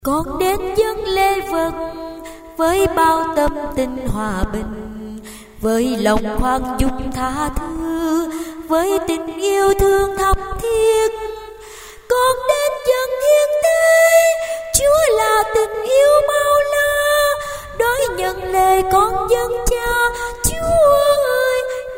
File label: sop alto